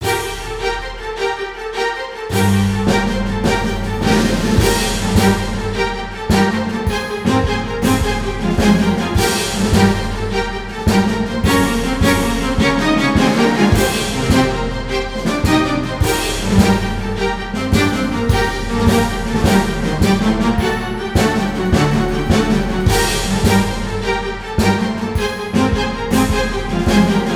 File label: Trumpet Just Strings and Perc